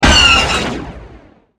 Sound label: fire; naboo n1 starfighter; lvs star wars